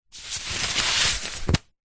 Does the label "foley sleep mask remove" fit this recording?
Yes